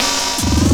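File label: LOOP snare drum, Drum & Bass, 160 BPM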